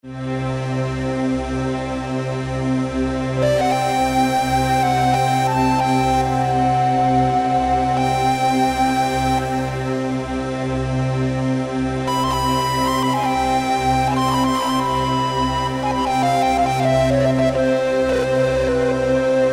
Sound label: E.S.P, Yamaha TG500